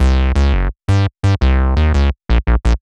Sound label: drumnbass 170bpm Bass C; musicradar; Basslines A